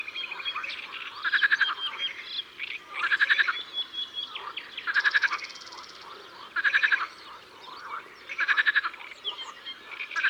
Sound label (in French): Grenouilles rieuses, Concerts Naturels de la Drome; Studio les Trois Becs